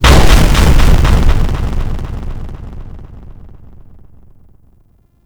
Biped Stomp C; Warframe; Corpus; Ostrich; Shockwave

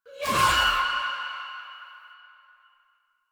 Choir Erupt Proj Throw; Warframe

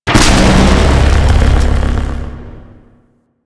Electricity Zap; Props; Ambience

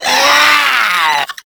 Infested Runner Attack; Warframe; Runners

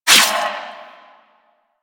Shadow Melee Swipe; Warframe; Duviri

